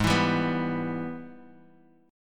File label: Ab Minor Major 7th Sharp 5th, down, Guitar, Drop D; strummed; JGuitar